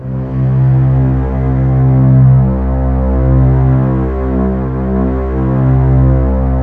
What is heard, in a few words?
WARM VIOL, JV-1080 ORCHESTR; Syntec; Wall of Sounds VOL-2; ORCHESTR-EXP